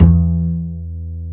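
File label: double bass E2; Univers Sons; Jazzistic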